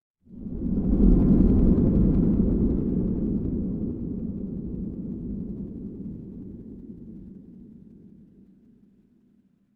horror wind whisper

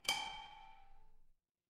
impact metal hit

